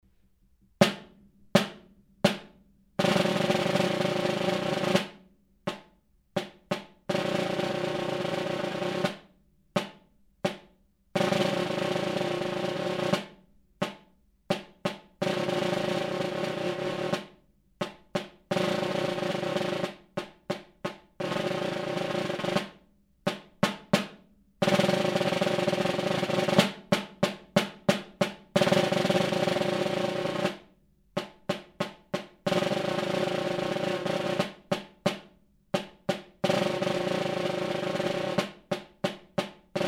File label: snare